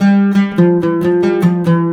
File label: LUTH, Arabian Traditions; USB Soundscan vol.43; AKAI